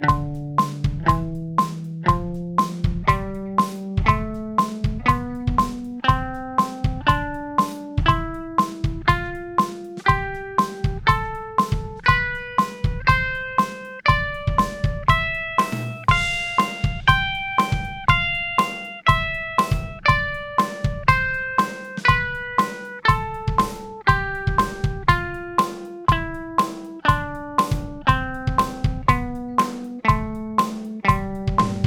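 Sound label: A minor #4 Dorian 120 bpm half, guitar; a minor diatonics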